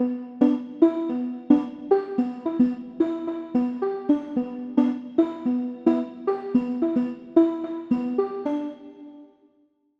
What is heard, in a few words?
compverb